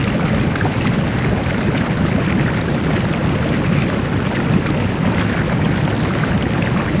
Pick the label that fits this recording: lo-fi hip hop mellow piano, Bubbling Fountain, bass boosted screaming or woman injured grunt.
Bubbling Fountain